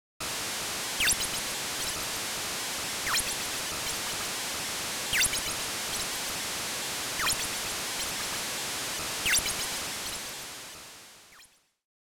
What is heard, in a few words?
Sewer Ambience; the cowabunga collection